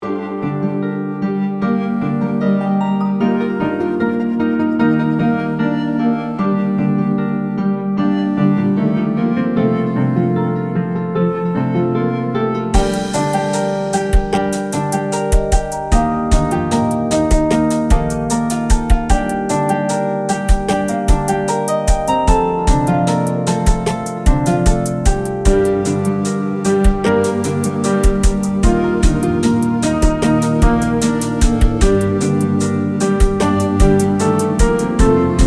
loop, An upbeat melancholic jam for a space level or cinematic montage